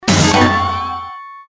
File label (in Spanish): palabra acertada; hangman